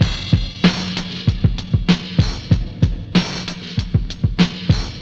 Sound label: Drum loops Boombap